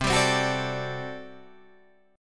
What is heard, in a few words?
G Major 7th Suspended 2nd Suspended 4th C